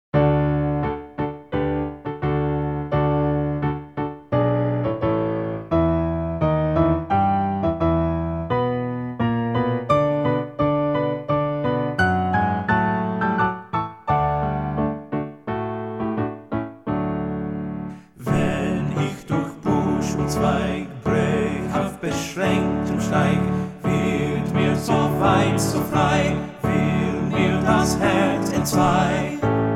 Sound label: TTBB